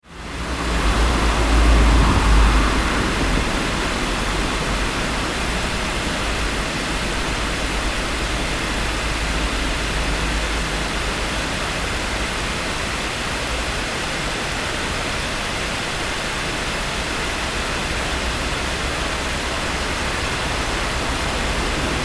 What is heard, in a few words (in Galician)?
Ponte Nova